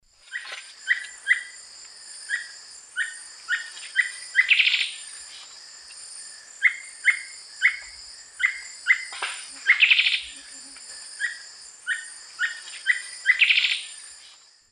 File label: Thamnomanes caesius, call; S. America; Eastern Lowlands